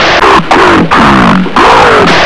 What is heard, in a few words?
zombie idle; svencoop